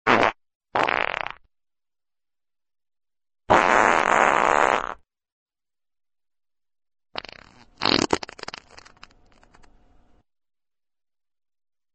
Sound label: farts